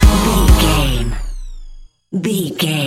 wind me fall sting, Dance, EDM, Club, Exercise, Aerobic, Aerobics, Spinning, Workout, Disco, Eurodance, Confident, Energetic, Cheerful